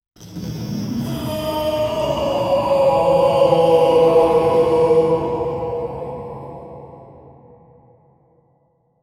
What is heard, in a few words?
evil grimace